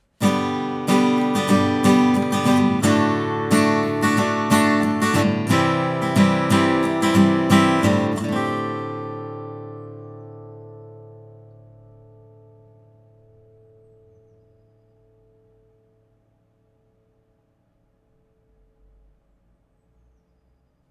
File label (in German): a gitarre